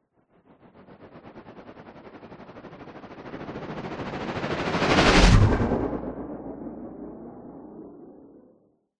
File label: sound design sweep stutter into thud growl and flanged tail; zapsplat